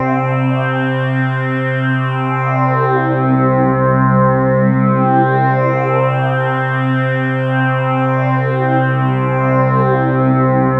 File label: Roland JV 2080 LFO C2; MISSION LFO